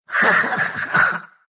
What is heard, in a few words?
laugh, ru; Dota 2